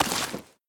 Pickup Bleach; The Inn

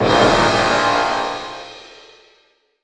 contusion hit

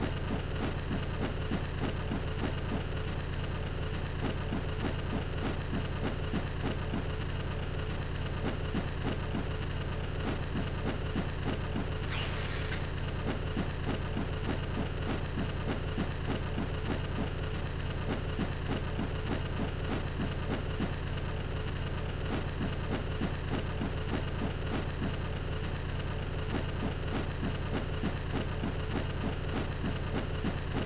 Ambience; Lilo & Stitch; GBA

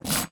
Skill Slash; Magic Shrek

